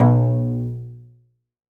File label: SOLO CELLO, Synclavier Strings; ILIO